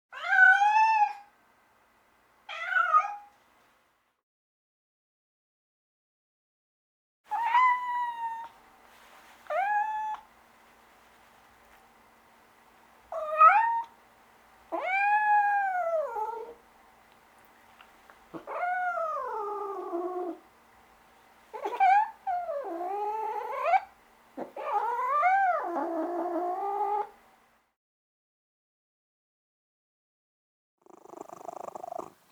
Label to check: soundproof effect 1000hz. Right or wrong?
Wrong